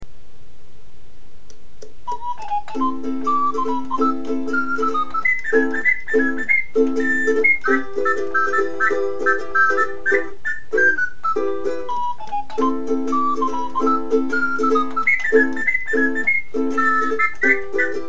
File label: Turkish March